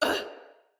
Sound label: Pain Female; Battle Barks; Envoy